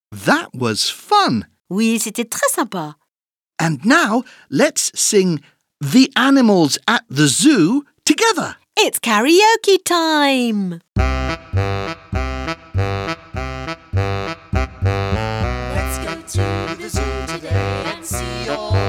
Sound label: Let's sing karaoké